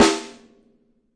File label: metal sd, snares; single hits